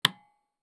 switch

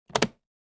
briefcase lock

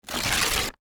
paper rip

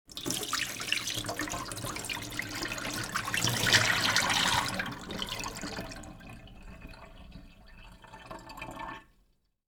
emptying water, kitchen

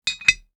glass cork close; drinks

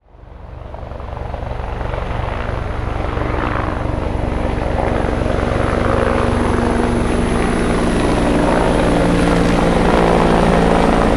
helicopter fly over; transportation

